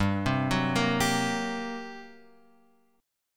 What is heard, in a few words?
G Minor Major 7th Double Flat 5th G, Guitar Open F; arpeggiated; x,2,0,1,1,2